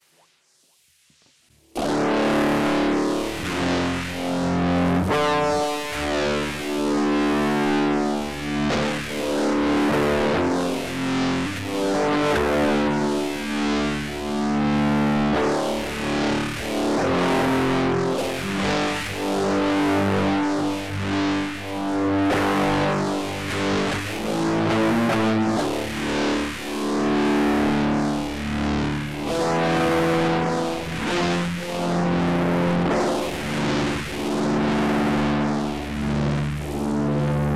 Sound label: stoner riff